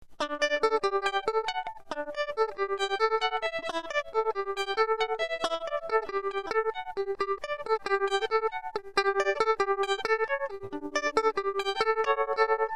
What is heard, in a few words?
tremolo